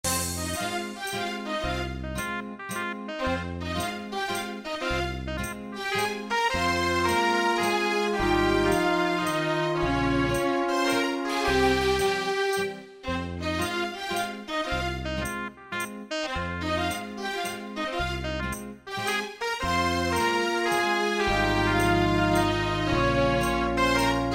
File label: waltz